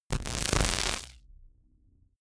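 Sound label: simple crackle short, science fiction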